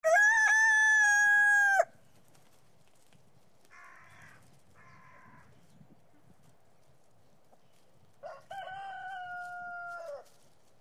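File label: rooster crow village atmosphere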